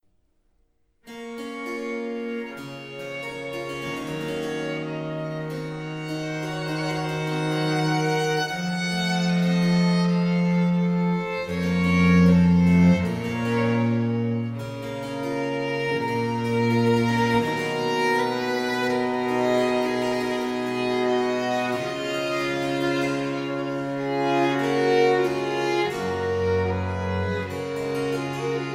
Sonata e minor Adagio; Stoelzel

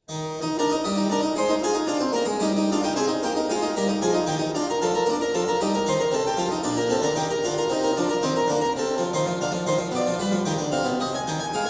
Prelude E Major; J S Bach; Prelude No5

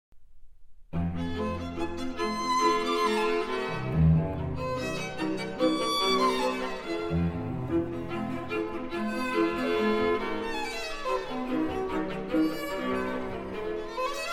String Quartet F Major Finale Allegro

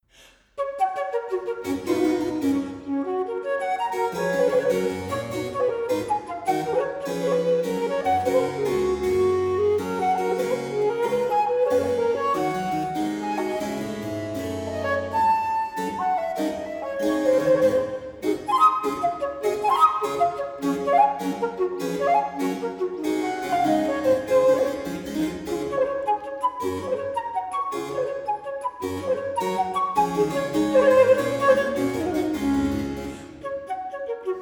Sonata in G Major Giga Allegro moderato; Leclair

